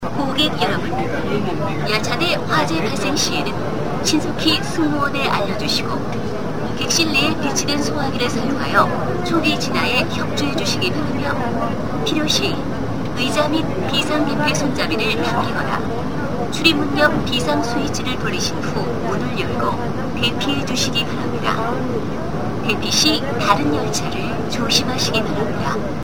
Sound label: Line 2 fire